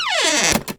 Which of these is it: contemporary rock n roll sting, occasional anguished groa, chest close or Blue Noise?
chest close